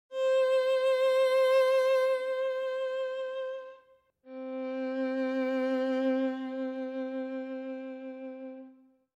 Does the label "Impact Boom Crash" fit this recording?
No